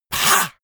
monster hiss; koerpergeraeusche; comedy-cartoon; soundpool

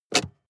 sports car int handbrake pull short; verkehr-transport

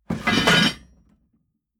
metal scrape wood hard

